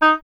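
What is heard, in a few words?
oboe, wind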